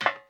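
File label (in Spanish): Zapato tacon metal, heel shoe on metal; yesterday origins gamerip 2016 switch ps4 pc ios macos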